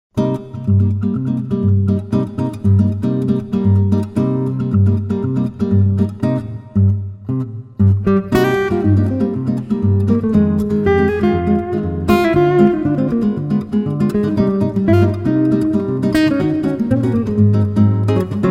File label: guitar